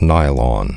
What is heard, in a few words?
nylon